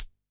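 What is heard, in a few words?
Analog SR88 Closed Hat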